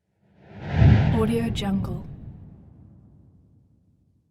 thunder whoosh

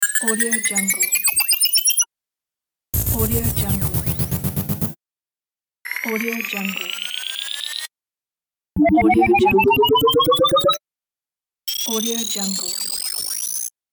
scifi loader

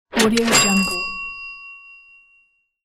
cash register

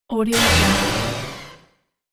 robot space impact futuristic fx

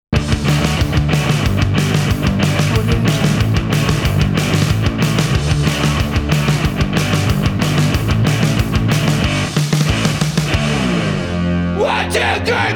punk rock ident

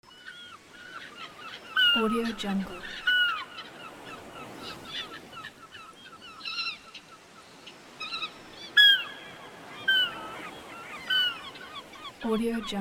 seagulls

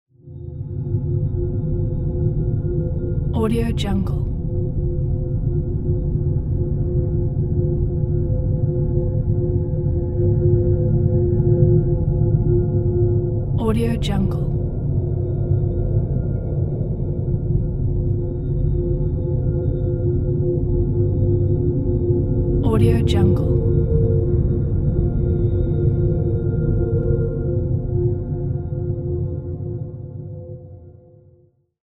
deep space ambient

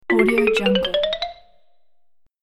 cartoon ladder climb up

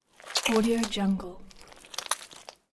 guts squish

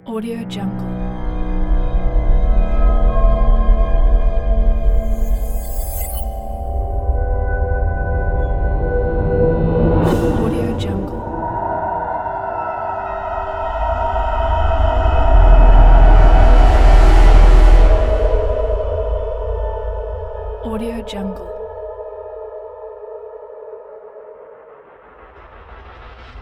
trailer ethereal

